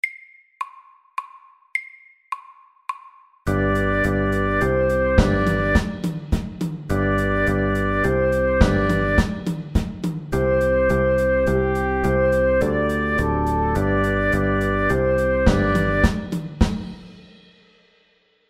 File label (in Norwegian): Fusion MIDDELS